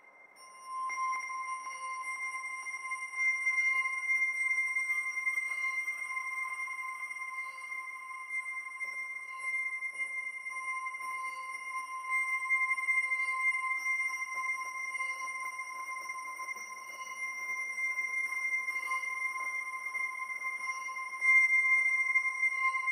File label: glockenspiel orchestra; bespoke - Floral v.1